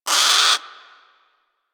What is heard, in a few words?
Sea Bird Tranq Dart Grunt; Warframe; Hunting Critters; Ostron Sea Bird